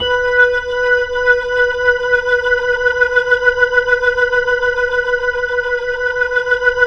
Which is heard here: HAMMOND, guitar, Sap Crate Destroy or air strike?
HAMMOND